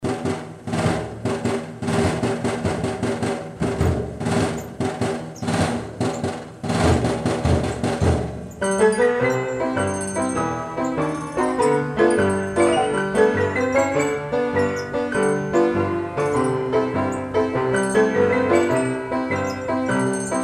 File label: orchestrion; Coinola Orchestrion Model 610; honky tonk Nickelodeon sound